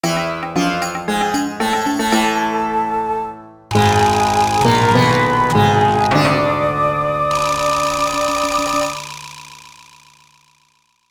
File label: Boss Victory; Sandstorm Steps